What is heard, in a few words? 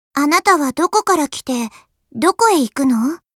applause